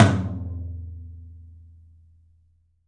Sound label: TOM, Studio Toms; 3D Percussives